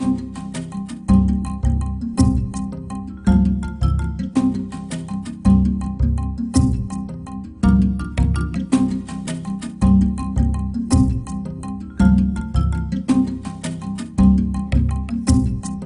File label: Jungle Level